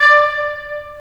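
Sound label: wind; Roland - LA Composer vol. 3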